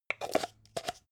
jar plastic midsize close

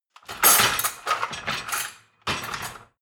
dishwasher cutlery basket take out

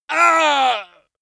male pain

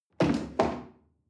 chair wood place medium

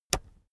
keyboard external space push heavy

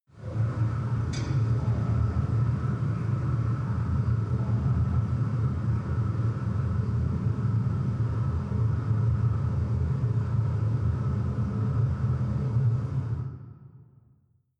machine room drone metal click

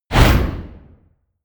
whoosh

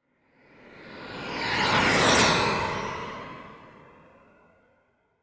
flyby

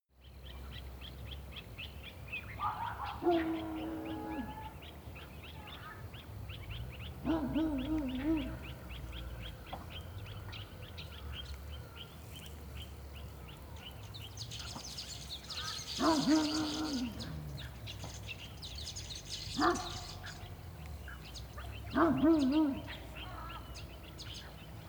africa winter dog bird

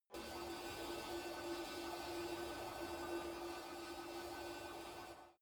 space alien fabric working quiet

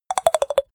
click rapid sequence down

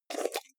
jar plastic midsize open fast

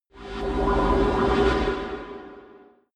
space machine alien

